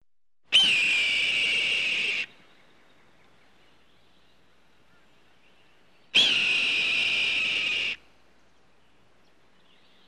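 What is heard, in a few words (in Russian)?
краснохвостый ястреб крики ястреба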